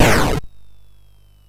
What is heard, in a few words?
Frantic, MOS6581R4